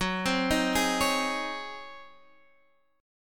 C sharp Major 7th Suspended 2nd Suspended 4th F sharp